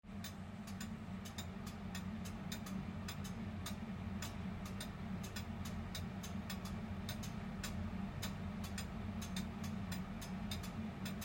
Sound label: Duelling ticks